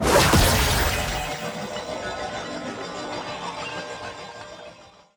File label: Attack Heavy; bloom minigame